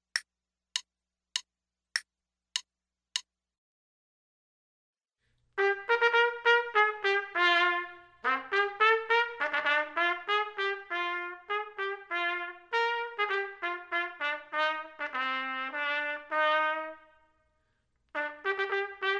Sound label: trumpet; Selected Duets for Trumpet